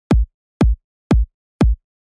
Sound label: house, EDM